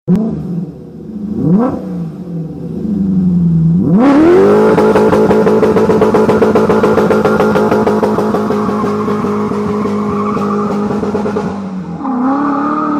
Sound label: Bmw E30